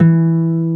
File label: HARP E2; AKAI S-Series CD-ROM Sound Library VOL-8